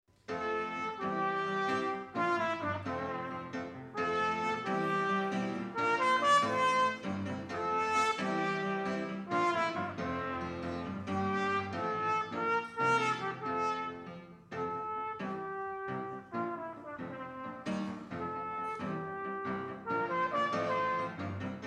Gospel Jungbläser

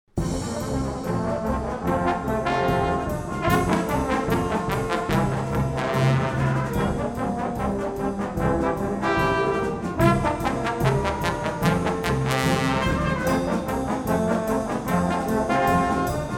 Solo für Posaunen

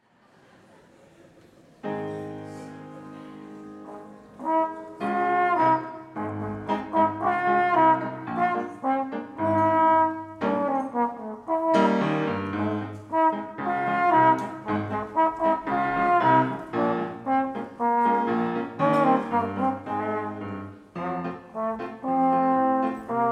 Dance Jungbläser

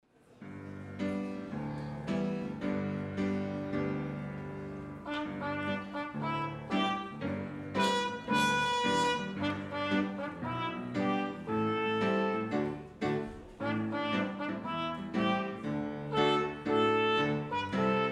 Tom Dooley, Trompete; Jungbläser

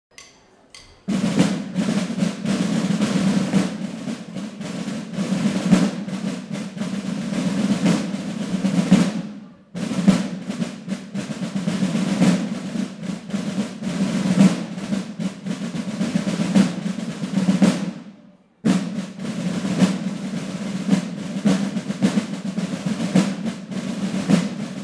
Konzerteröffnung Tambouren; Holziken

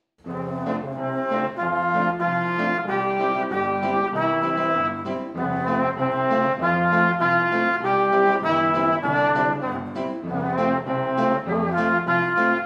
Bläserensemble; Musikschule

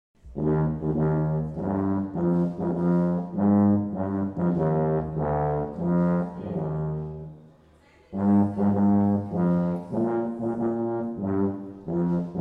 Tuba-Solo; Musikschüler

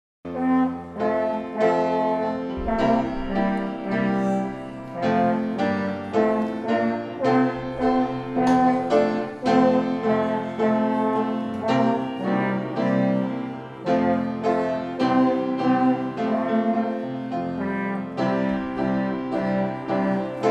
Bläserensemble; Musikschule

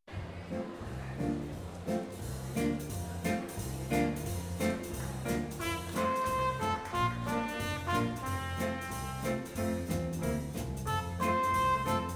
Jungbläser

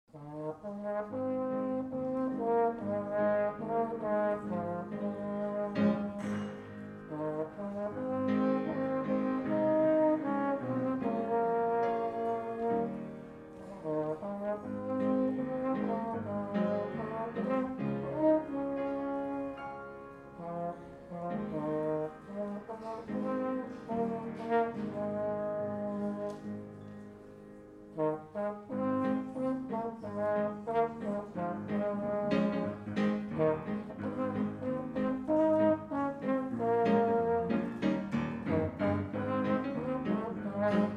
Red River Valley, Es-Horn; Jungbläser

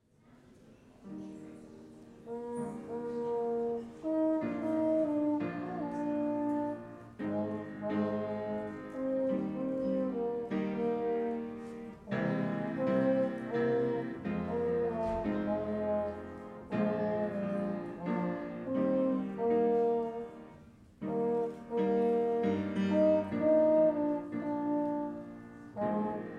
Santa Lucia, Es-Horn; Jungbläser

